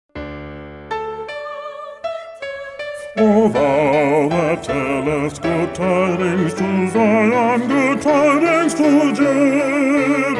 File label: o thou that tellest good tidings bass; Messiah; Handel